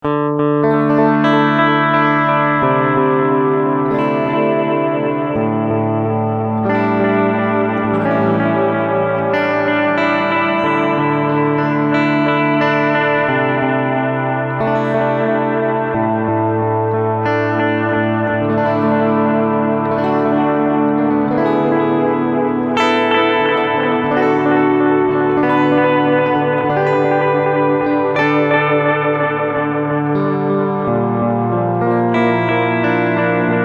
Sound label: Tape Delay